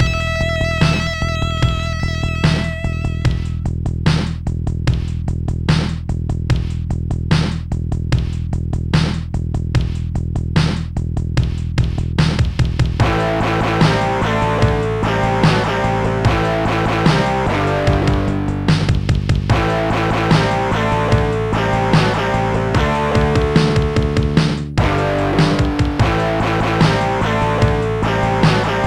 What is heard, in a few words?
beat; dio beat; Sonix Music Driver